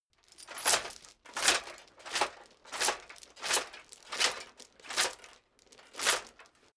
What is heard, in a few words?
metal chain movement on plate sequence